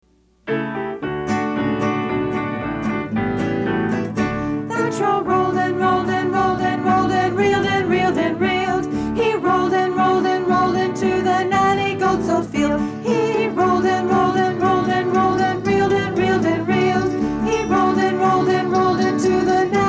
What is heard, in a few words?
rolling song with voices; nanny goat